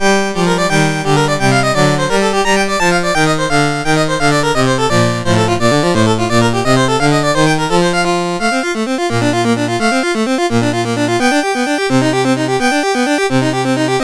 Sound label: Toccata, MOS6581; Syl